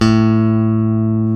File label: BASSES, Roland